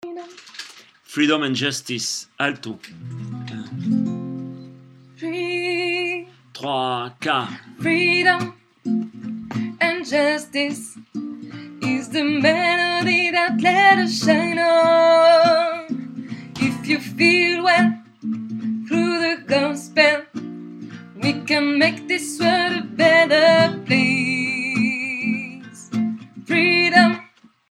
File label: alto